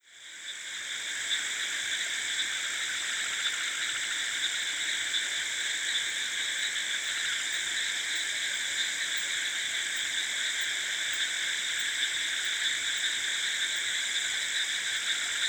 Rice field frogs and insects close, wide at night, South India; Charlie Atanasyan; Faunethic